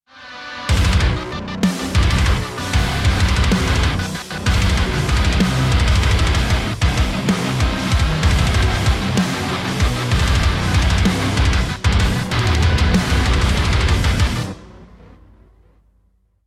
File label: start action, music